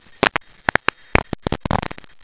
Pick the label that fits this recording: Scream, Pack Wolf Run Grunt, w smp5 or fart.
fart